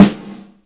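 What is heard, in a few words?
DELAY GATE SNARE; InVision Interactive; Lightware VOL-1; Instruments & Percussions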